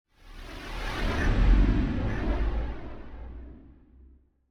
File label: far away ship; soundscrate